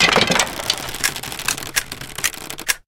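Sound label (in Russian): звон покатившейся пулеметной ленты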